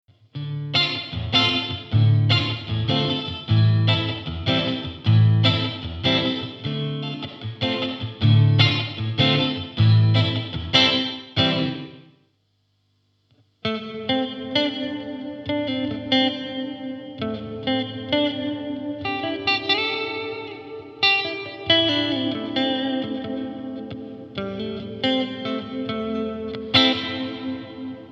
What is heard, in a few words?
nux frontline 15 room and hall